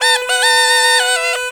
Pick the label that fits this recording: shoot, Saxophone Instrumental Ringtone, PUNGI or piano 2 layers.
PUNGI